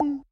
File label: Slime Vox Small